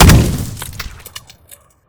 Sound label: weap fire; gmod; weapons